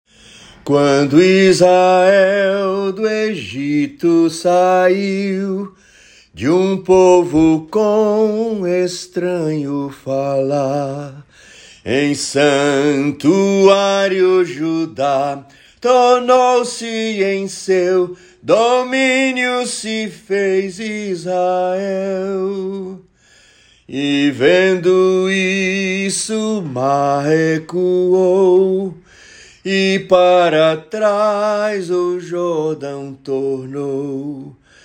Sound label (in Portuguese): salmo cantado